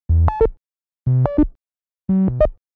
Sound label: Call Signs Small call, beeps & tones